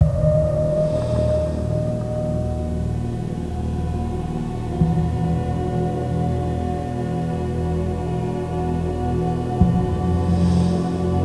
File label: Lab Music